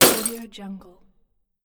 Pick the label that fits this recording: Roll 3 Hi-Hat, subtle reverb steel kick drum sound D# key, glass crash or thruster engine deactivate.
glass crash